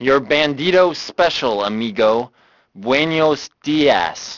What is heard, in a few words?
band; Grand Theft Auto Vice City; XBOX